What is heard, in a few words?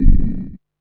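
MISC Right, Techno 138 BPM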